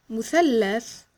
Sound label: triangle